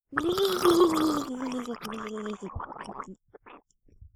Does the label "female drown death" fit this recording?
Yes